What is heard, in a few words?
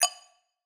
Synthetic Clang